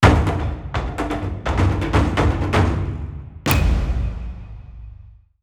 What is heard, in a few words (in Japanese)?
大太鼓の和風ネタで使えるジングル; japanese style jingle drums